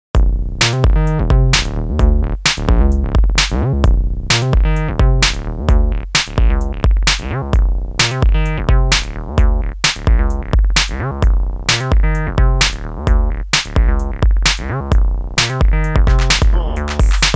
snowday acid; weeklybeats 2024

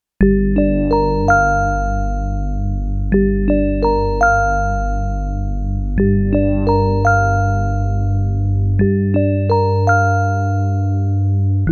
lullaby; weeklybeats 2024; orphaned tadpole lullaby

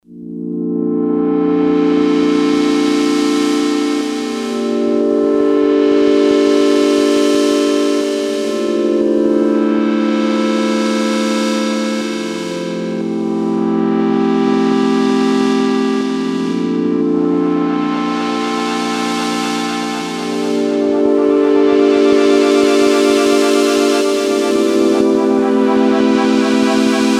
waves korg minilogue; weeklybeats-2018

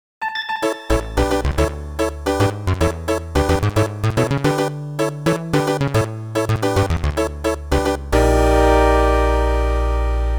bossa; weekly beats 2020

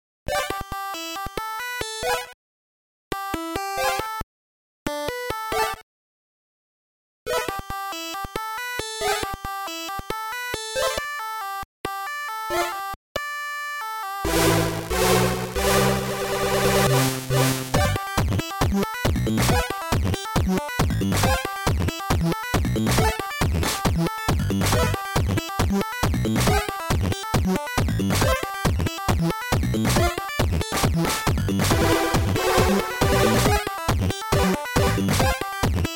jingle; weekly beats 2020

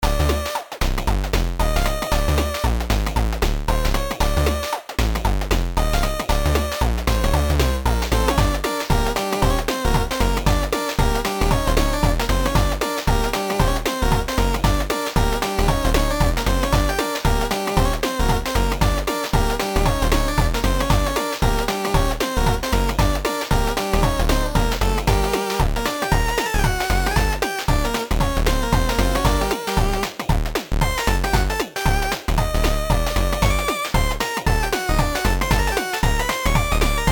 tribal jam; weeklybeats 2024

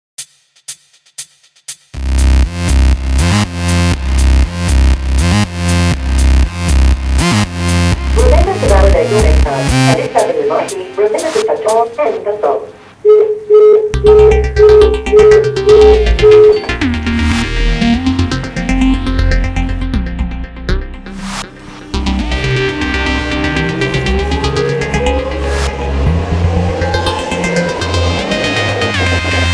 progressive dirty electro; train; weeklybeats 2012

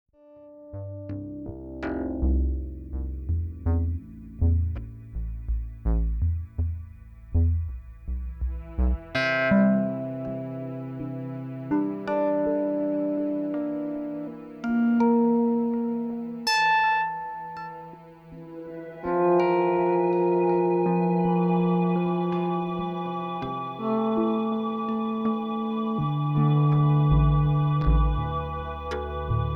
fm modular cassette genesis synth improv

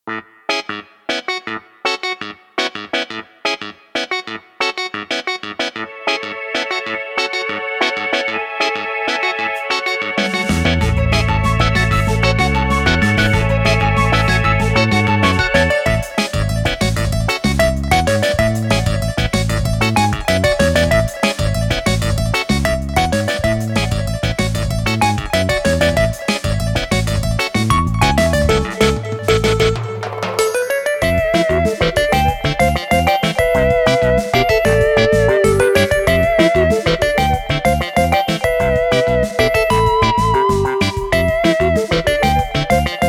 pizzicato; weeklybeats-2018

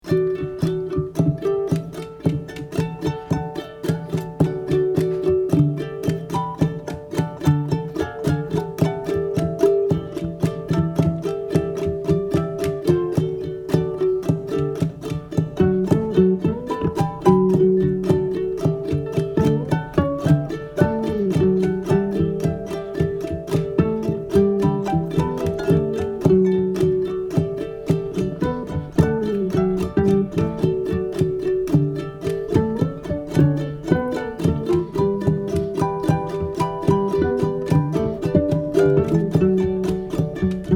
ukuleles by the sea

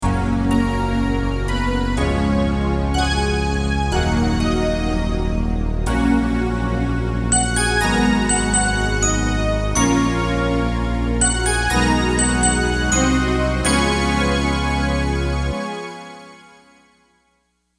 entrance jingle

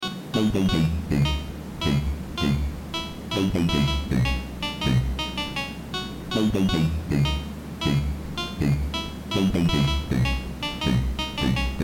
big beat track, 80bpm; weeklybeats 2024

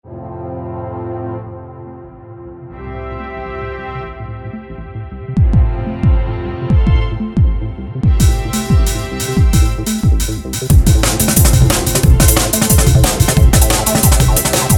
180bpm; three is binding; weeklybeats-2020

